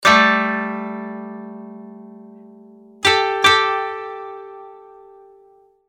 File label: koto